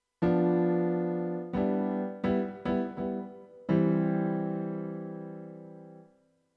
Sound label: piano grand; Piano = první 112 Patch Grand